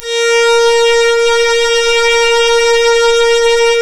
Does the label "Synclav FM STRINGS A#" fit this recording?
Yes